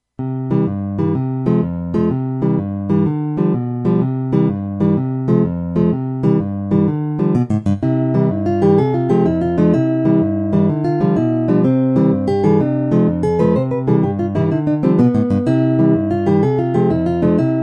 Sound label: ten tone